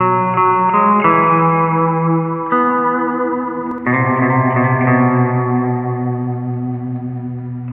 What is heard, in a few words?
guitar, stringed instruments